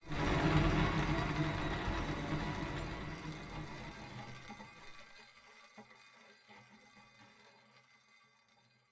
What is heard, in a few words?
big wheel spin start